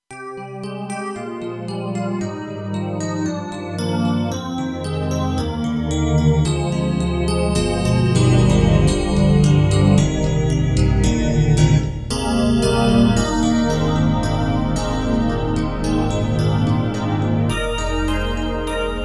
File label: KORG TR-Rack Belliano